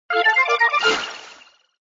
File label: Round Clear; SpongeBob; Fisher-Price iXL; Bubble Storm